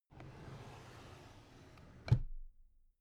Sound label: drawer cabinet carton close soft